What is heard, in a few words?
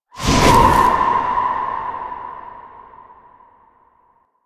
Corrupted Void Ghost Drain Aura Start; Warframe; Zariman